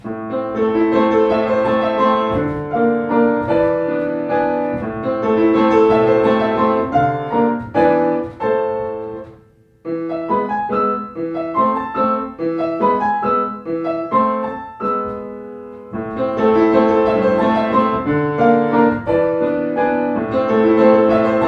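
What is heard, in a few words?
Beethoven Bagatelle, Yamaha Klavier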